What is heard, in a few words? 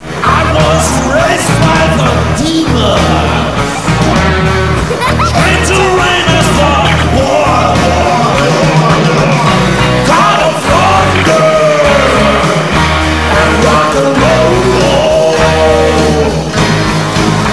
thunder; lmctf